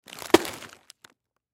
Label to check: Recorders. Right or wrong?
Wrong